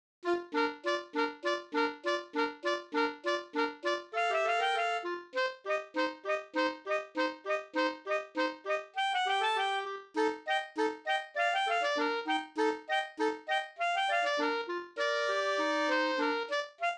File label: the clock oboe duet